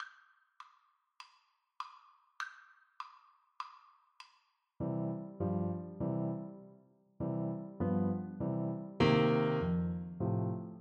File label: Piano Duet